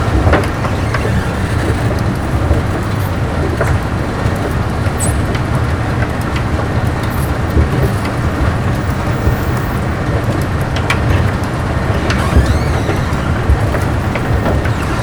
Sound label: escalator loop